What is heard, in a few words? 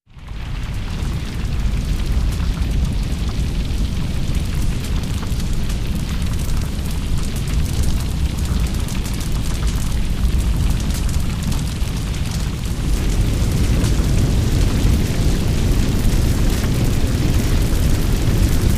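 Hillside Fire Burning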